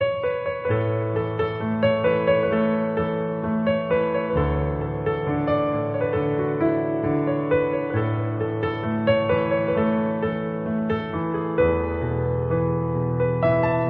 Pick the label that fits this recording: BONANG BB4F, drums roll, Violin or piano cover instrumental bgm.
piano cover instrumental bgm